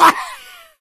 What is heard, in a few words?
smoker pain short; voice